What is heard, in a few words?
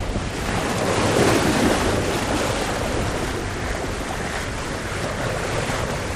Water Splash Off, bow wash